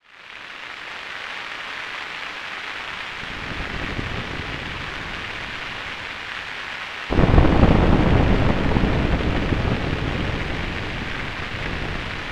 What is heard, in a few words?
virtual analog synthesizer